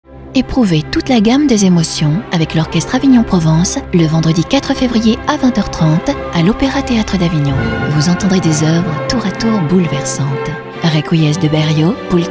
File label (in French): orchestre concert; orchestre d avignon